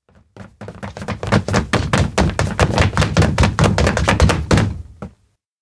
steps indoor new hall run multi; rikka; chiharu; ken; fumiya; anemoi パッケージ版 1.1

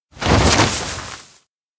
surprise; spica; anemoi

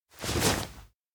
try undress; koyomi; anemoi パッケージ版

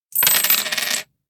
pay cash; anemoi

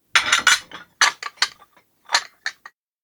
close prep dish cleanup; anemoi

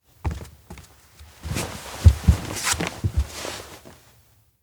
sit cushion; anemoi

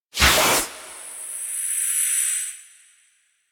elec pendant show way; anemoi

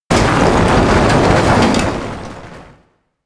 collision boom; anemoi

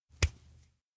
hold hand; awayuki; anemoi パッケージ版 1.1

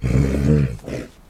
Horse; anemoi

